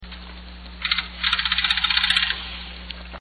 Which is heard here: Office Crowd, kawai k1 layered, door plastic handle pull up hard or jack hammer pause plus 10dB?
jack hammer pause plus 10dB